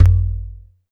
Perc, Alesis DM5

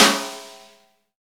SNR, Snare

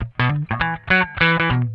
SWING IT, Funk Guitar; Zero G - Funk Guitar

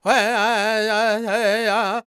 G# MIN, World Voices, LAKOTA; Spectrasonics Vocal Planet CD5

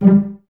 CES G Pizz, Cellos; Miroslav Vitous; String Ensembles

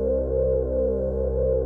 JUNO 60 C3; Hollywood Edge - Giorgio Moroder Rare Synthesizer Collection

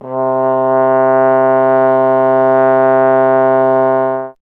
Trombone C; Miroslav Vitous - Solo Instruments VOL-1

